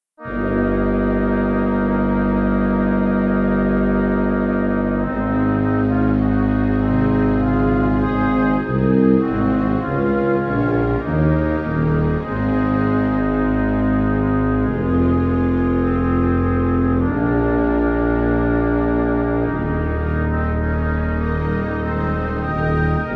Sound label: Instrumental